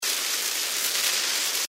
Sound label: dynamite Fuse Loop; Dynamite Digger Eureka